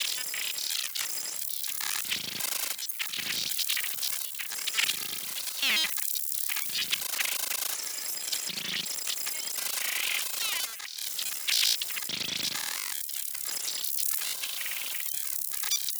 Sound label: User Interface Abstract Processing; Glitchedtones